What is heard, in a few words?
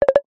ui notification tone x3 fast